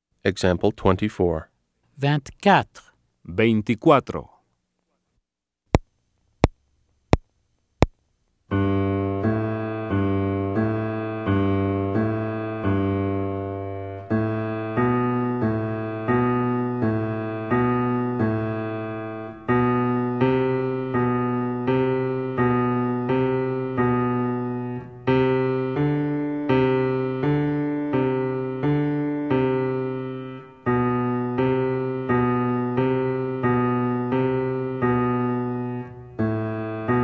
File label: Keyboard